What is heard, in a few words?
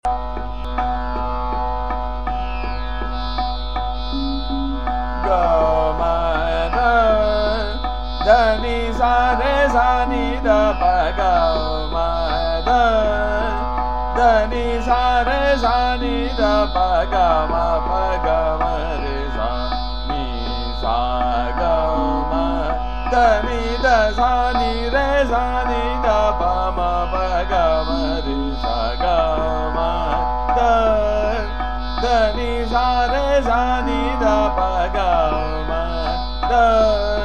Sargam - Trital, Hameer; G m D D N S' R'